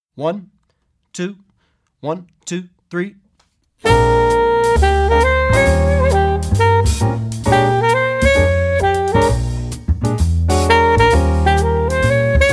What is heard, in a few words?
Blues (With Soloist